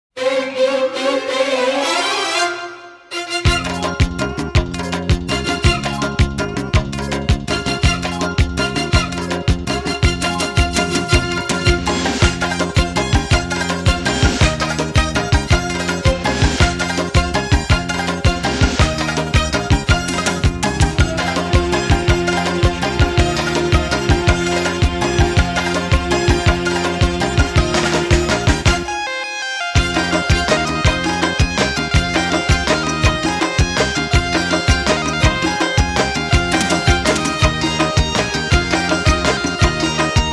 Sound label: Instrumental